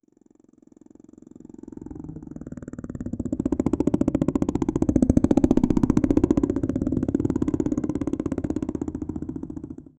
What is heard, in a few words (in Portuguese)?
som de uma engrenagem sua; gear